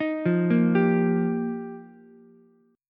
F 9th Suspended 4th F Ukulele C6, chord; arpeggiated; JGuitar

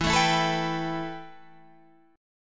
B 7th Suspended 4th Sharp 5th E

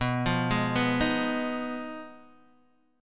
B 6th Flat 5th B down Guitar DADGAD

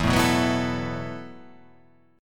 E Suspended 2nd Suspended 4th E, down; strummed; JGuitar

